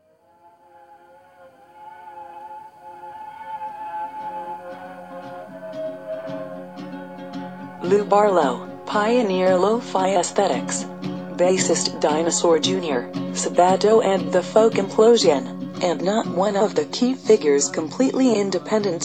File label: noise pop